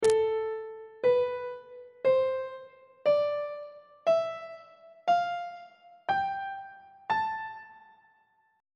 b double flat melodic minor scale classical descending; on treble clef